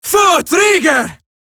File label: attack, female